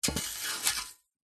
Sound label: food lemon slide drop onto hot metal sizzle; zapsplat